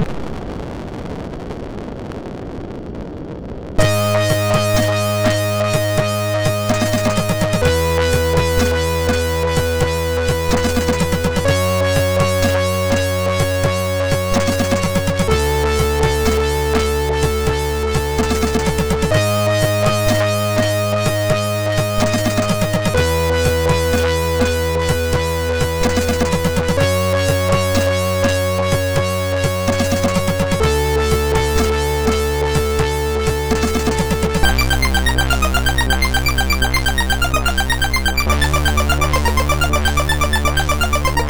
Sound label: Intro, MOS6581R3; Ossi